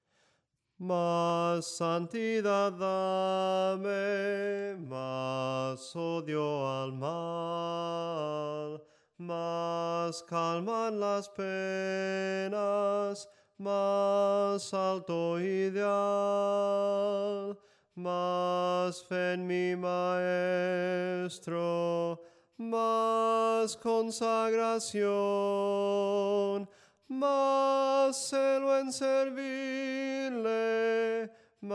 SOPRANO CANTADO; MAS SANTIDAD DAME

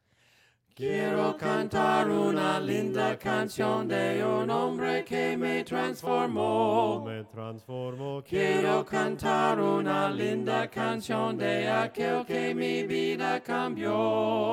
VOCES, himnos a capella; cantos del camino

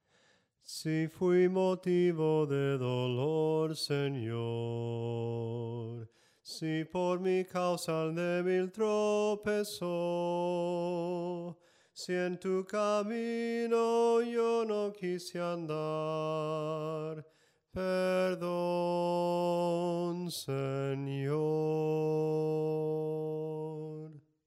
SOPRANO CANTADO, himnos en melodia soprano

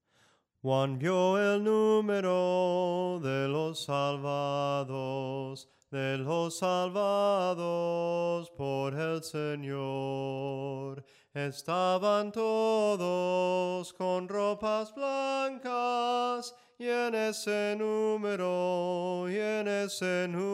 SOPRANO CANTADO; JUAN VIO EL NUMERO

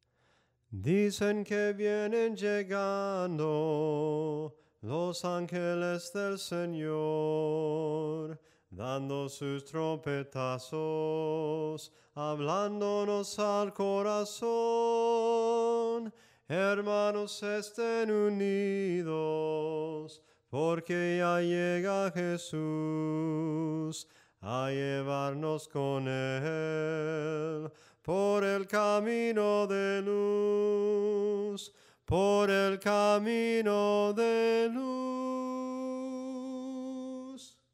SOPRANO CANTADO; DICEN QUE VIENEN LLEGANDO

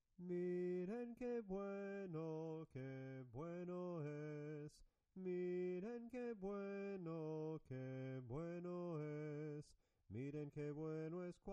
SOPRANO CANTADO, himnos en melodia soprano

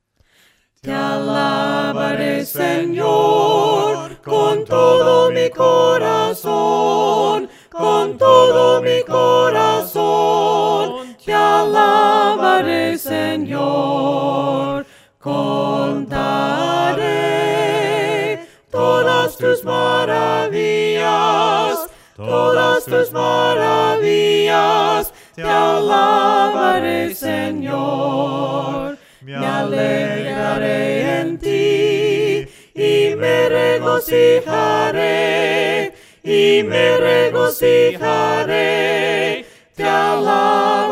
VOCES, himnos a capella; cantos del camino

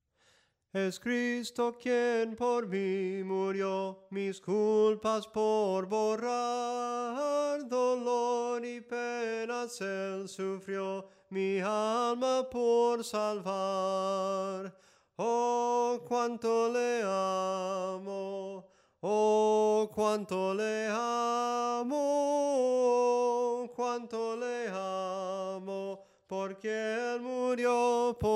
SOPRANO CANTADO; ¡OH CUANTO LE AMO

